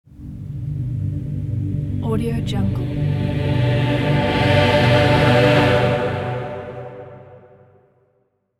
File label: the rising chorus